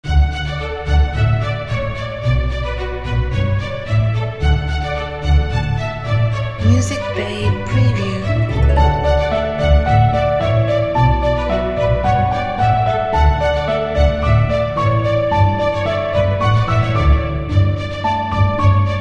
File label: Funny Game for the Kids